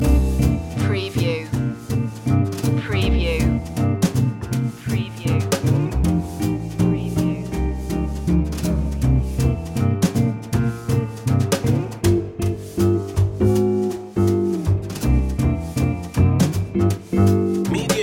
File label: kids blues long loop